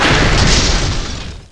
crush; Total Chaos Nukem 3d Pack; duke

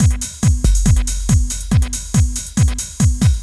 beat

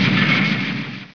explosion-magic; Vitamin

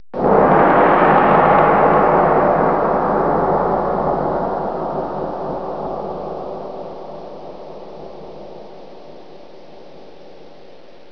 gong

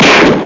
gun; amigatextureenginedemo

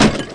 crash

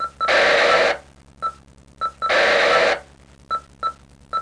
morse, communic; WAV Sound Effects; office

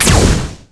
ener weapon; StarSiege

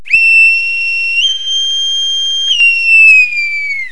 Whistle - Boatswain; Olduvai Sounds

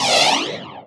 powerup; Grounch

